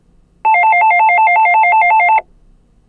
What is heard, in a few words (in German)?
Etagenruf Alphaton; Klingeltoene